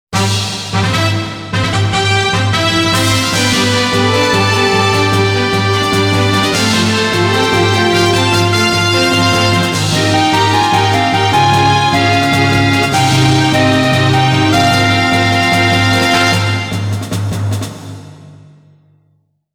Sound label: Classic Concert; Angel Graffiti Anata e no Profile